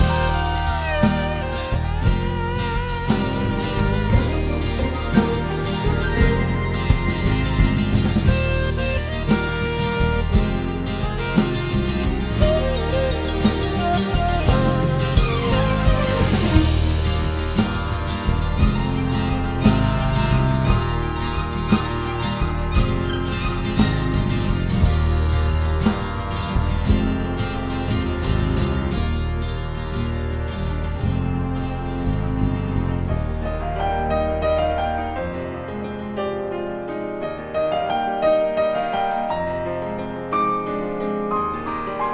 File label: Menu Loop; rakoo friends wii u 2015